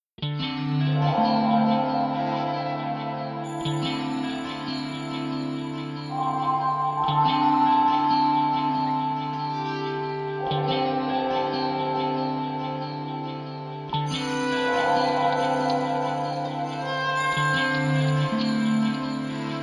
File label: AMB Guitar